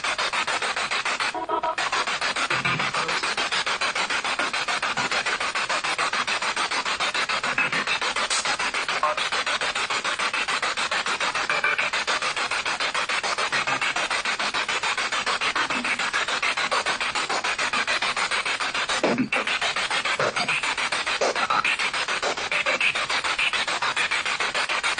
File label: Living Room Spirit Box; private residence freedom road apartment investigation